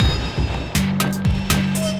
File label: Streamed Kit, drums; Ableton; Digicussion 1; Streamed Kits